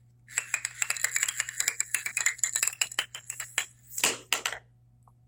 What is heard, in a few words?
Plinko